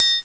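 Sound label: triangle; CMI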